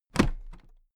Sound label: toolbox place upright regular